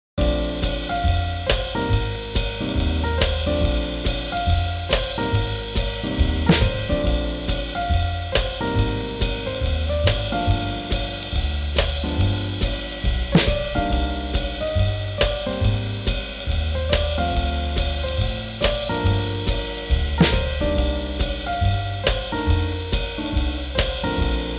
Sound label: Swing Jazz